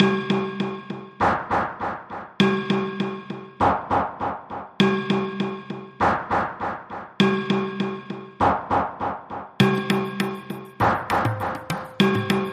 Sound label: Deranged Suspicion Percussion Only